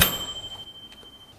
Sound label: cash register